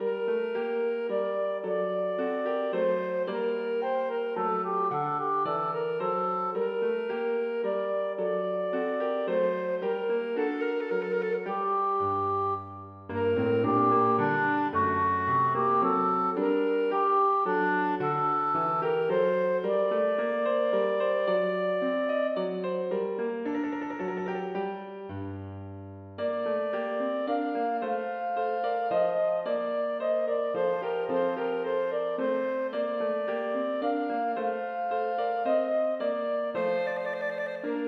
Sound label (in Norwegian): Menuet for klarinett og piano; Aamodt